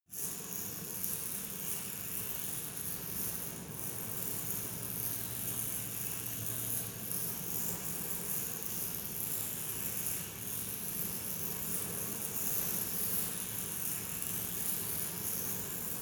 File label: resonator constant